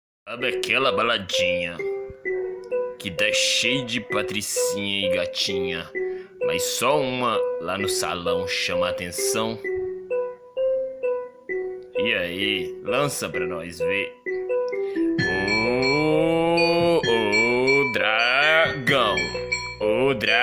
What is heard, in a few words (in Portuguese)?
funk